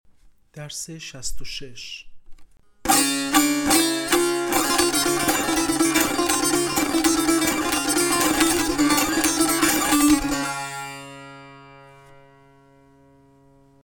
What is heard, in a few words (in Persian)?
مشق تنبور